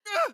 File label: Jump; Morty